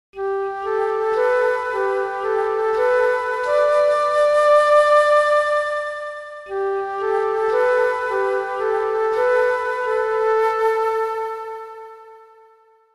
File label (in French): reve musique